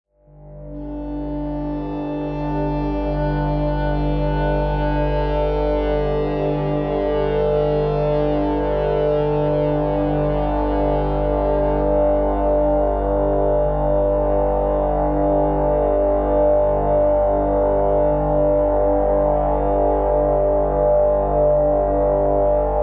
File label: looping no input mixer